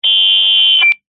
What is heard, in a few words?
cb radio feedback short burst